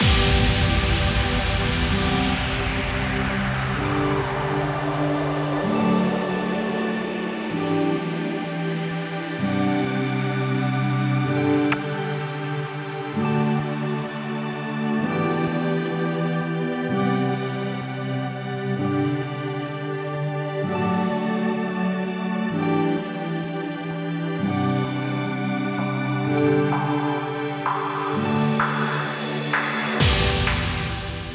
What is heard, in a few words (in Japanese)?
BD Pad, シンセパッド2種類のサウンドにアコースティックギター風のサウンドを重ねています。; シンセパッドを鳴らしたブイレイクアウト部分; 更にその音をステレオエンハンサーで広がり感を出しています。